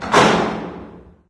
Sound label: door metal large close